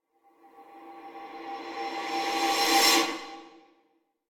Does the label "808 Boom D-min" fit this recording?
No